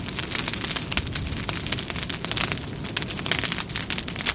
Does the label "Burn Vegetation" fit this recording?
Yes